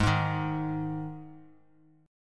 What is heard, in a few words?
Ab 5th Ab Bass Standard; strummed; JGuitar